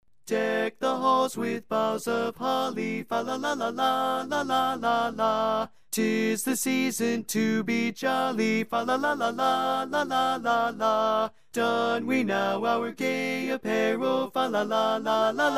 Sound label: Deck The Halls Baritone, Grand Chorus